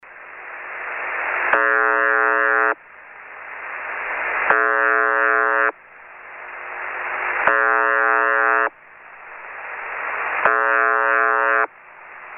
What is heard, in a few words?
the buzzer; The Buzzer 4625 kHz